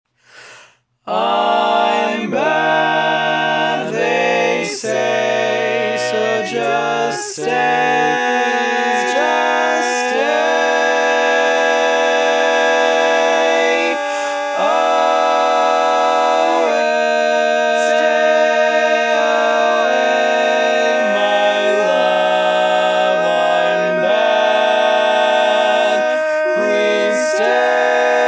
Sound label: barbershop tags, all parts